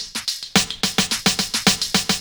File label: Percussive, Drums & Percussion, Beat Components; Electrocution